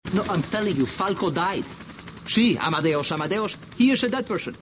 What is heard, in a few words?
tick